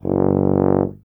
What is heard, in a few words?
BRS TUBA, Solo Instruments